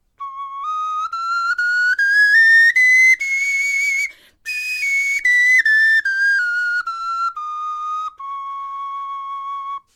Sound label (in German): tonleiter, knochenfloeten